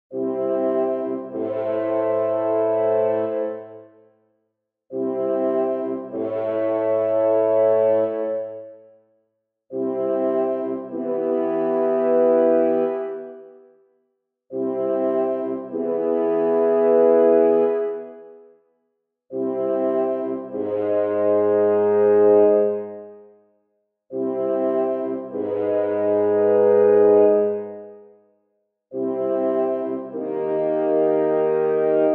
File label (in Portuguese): medianticas T maior